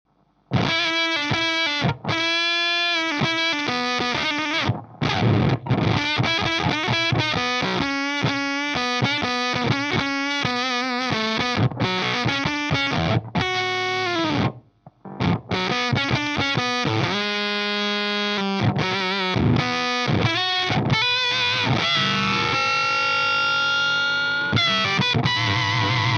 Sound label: FUZZ plus OCT